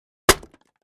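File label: 2x4 Smashed Sledge Hammer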